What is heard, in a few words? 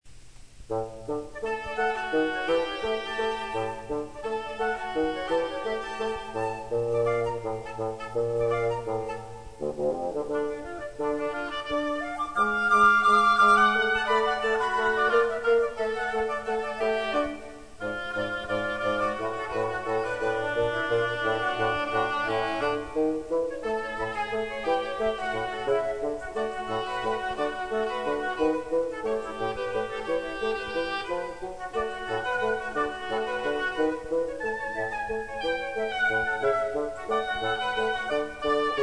Bassoon Trio